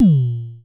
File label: FM Kicks A#2, Vermona Kick Lancet; LookIMadeAThing Kicks